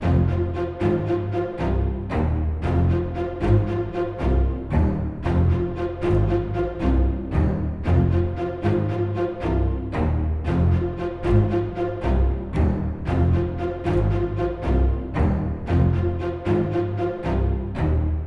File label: String; The Bureau XCOM Declassified